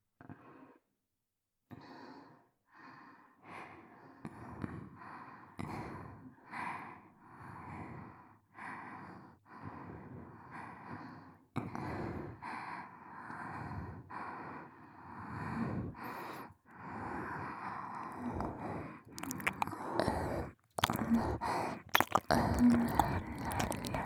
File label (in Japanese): 両耳舐め＋バック喘ぎ; ASMR